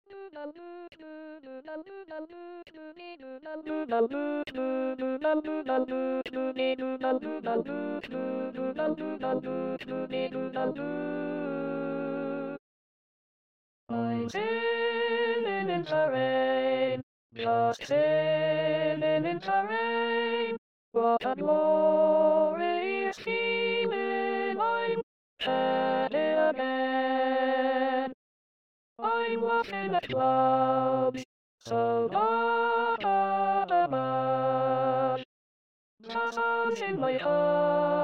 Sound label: Alto SATB